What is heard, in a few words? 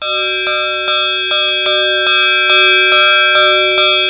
warning bell; cstrike; kz ins trainrun